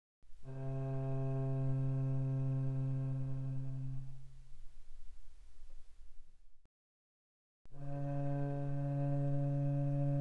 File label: Cello arco pp sul C C3 C4; mono; Strings